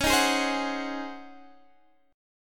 C sharp Major 7th Suspended 2nd Suspended 4th, C sharp; C#M7sus2sus4; strummed